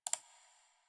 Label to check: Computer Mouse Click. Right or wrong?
Right